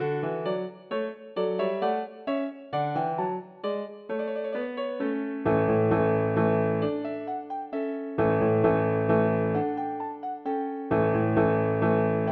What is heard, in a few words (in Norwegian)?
Menuet for piano